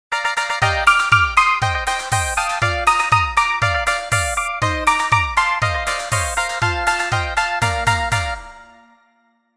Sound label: balalaika